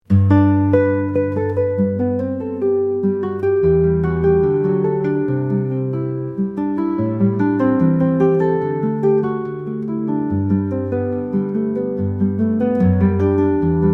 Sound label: gentle sunshine, akusticheskaya gitara